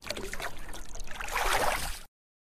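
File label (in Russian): мытьё посуды в реке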